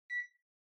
Air Conditioner (Far Beep)

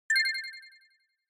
Short Accent Delay

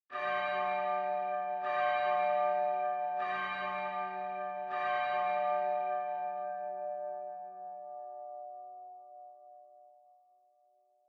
Church Bell Far High Long

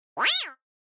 Cat Synthetic

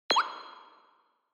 Button Click Low Reverb; Onoma

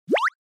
Motion Pop

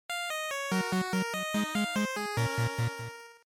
Game Over; GB; Action

